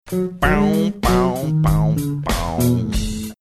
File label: singing along funky bass notes; blursday song